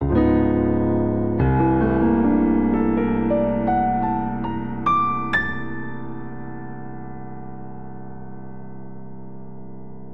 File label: Cadence Chromatic Descending C Minor9 77 bpm, Grand Piano; MIDI Clips; Ableton